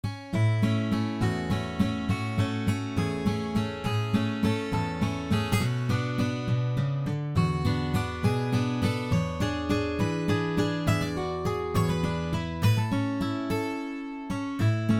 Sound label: Nokia tune